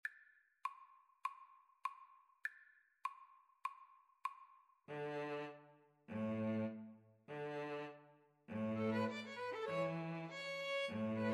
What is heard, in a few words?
neopolitan, String trio